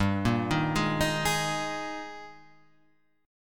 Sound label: G sharp Major 7th Suspended 2nd